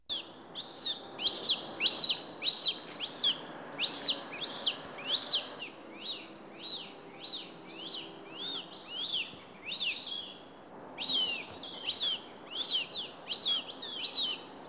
sharp shinned hawk cooper s hawk and northern goshawk